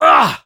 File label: pain sharp, fr